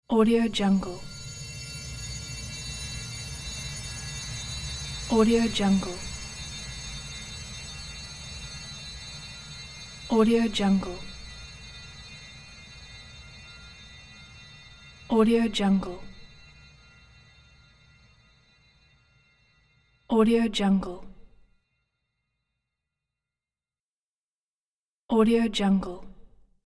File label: drone horror world